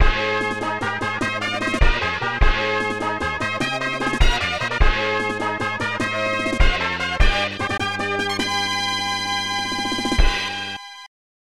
Race Start Fanfare; Derby Jockey; SNES

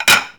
clear dishes; spongebob diner dash

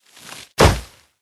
Barbarians Frost Giant Attack

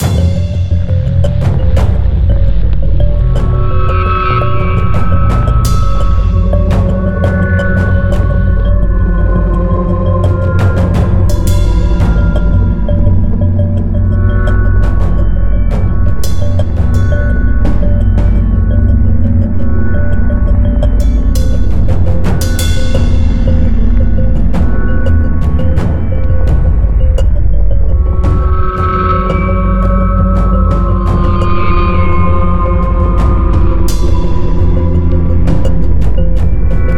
Tense

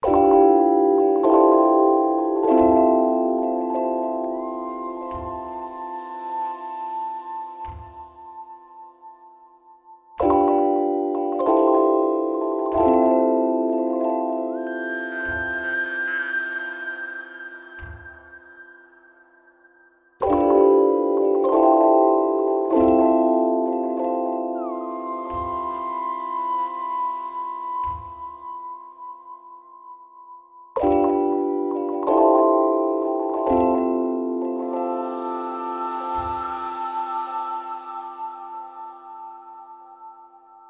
Lullaby; Among the Sleep

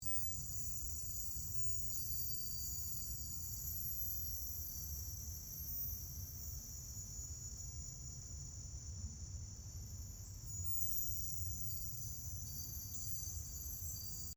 Floating Symbol Ambience

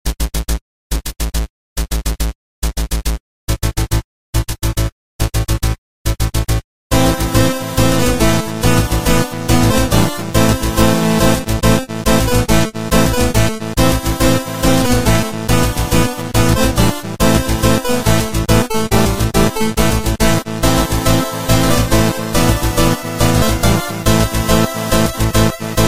Music Menu; Dash Quest; android

